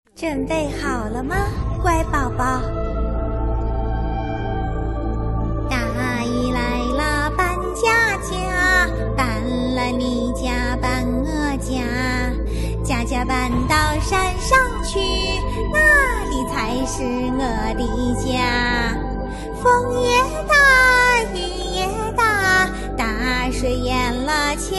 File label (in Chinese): 歌; 鼠婆歌